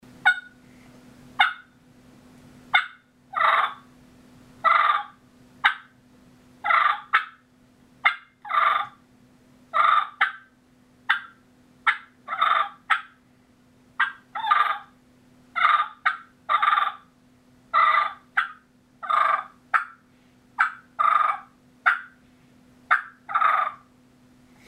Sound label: More Active Cluck And Purr, turkey hunting